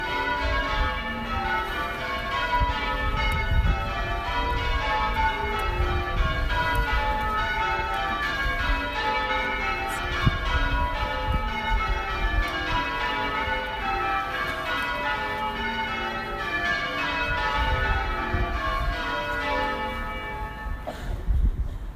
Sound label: Cardiff St Johns; The Bells Of St Johns Church, Cardiff